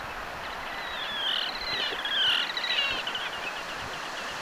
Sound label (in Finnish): tupsu uikkuparin soidinta; tupsu-uikkuparin soidinta tietääkseni